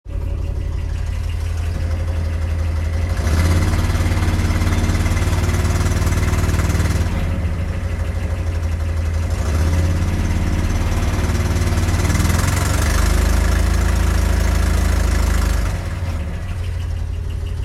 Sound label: 84 pickup 22r 2wd odd sounds during throttle